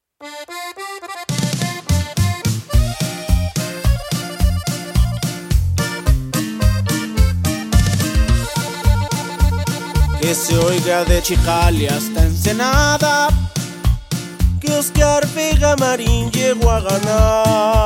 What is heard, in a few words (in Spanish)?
corrido